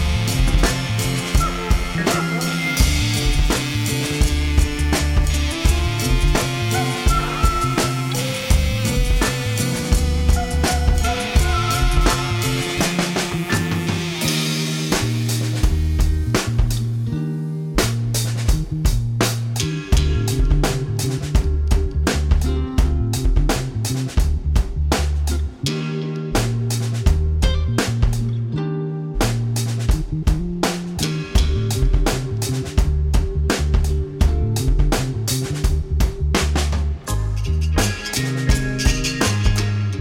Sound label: Favelas Instrumental